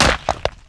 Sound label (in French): Coup et ecrasement